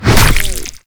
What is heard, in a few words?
Tentacle Swing Hit, Proto Infested Ancient; Warframe; Abilities